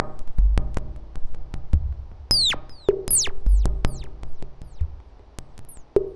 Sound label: Mod Loop, Modular Percussion